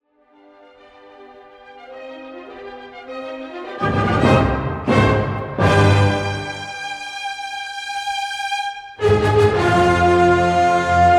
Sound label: classic stylus